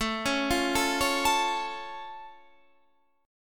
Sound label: A Augmented A, Guitar Standard; arpeggiated; JGuitar; 17,16,15,14,14,17